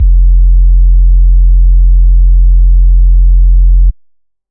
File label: bass sustain sustain F; Polyend; ARC Noise